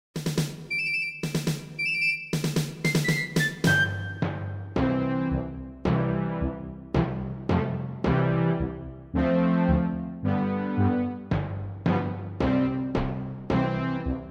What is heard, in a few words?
Instrumental; La reina a haci ana